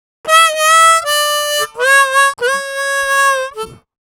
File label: Harm 098bpm A, Harmonica